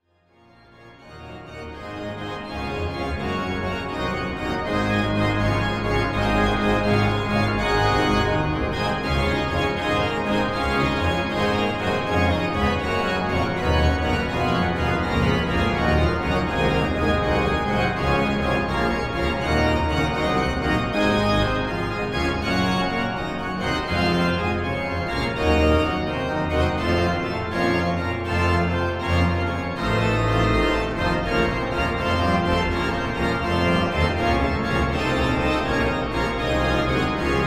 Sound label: Fuga G BWV 577